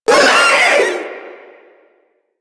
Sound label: Proto Infested vocal short attack